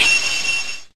Multi Attack; pokemon reborn e19 2022

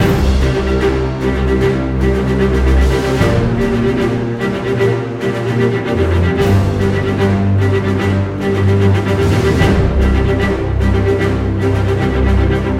sounds music; Halo Combat Evolved; Xbox

